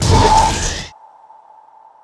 Dash; Go, Kizi, Go!; Dash1 Snd Dash1

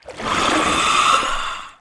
E washer attack

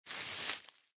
Thick grass

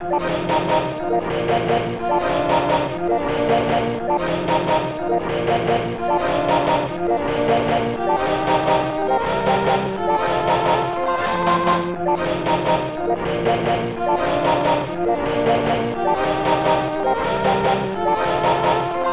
Haunted Pipe Organ; Porky Pig's Haunted Holiday; SNES

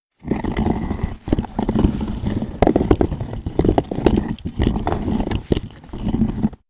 Rock Rumbling Grind - Stone Boulder Drag; Frogsong

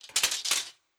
Mermaid Death Metal

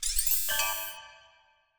Shape spawn; Touhou Jitsuzaisou Dream Logical World

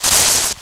Grass Walk; unsighted

